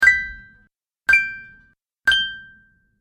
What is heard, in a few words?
Bright Bonus Sounds, video game